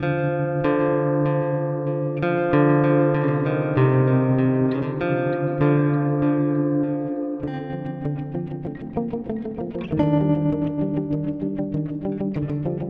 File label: Delay Reverb Modulation; UAFX